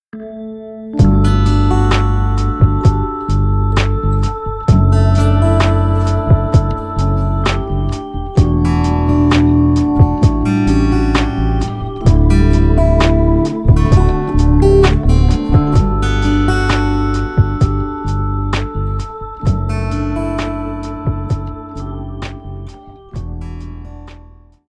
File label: Finger A3R, guitars; musical-instruments; el-ac-guitars